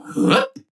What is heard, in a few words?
Grunt Jump; Mech